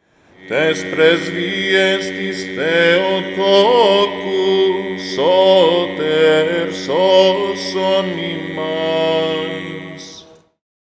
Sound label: Antiphon Greek